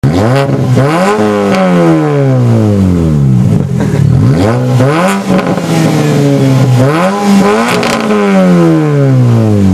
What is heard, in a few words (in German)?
auspuff sound ohne db killer, mini cooper cabrio